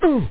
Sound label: UGH; lethal-t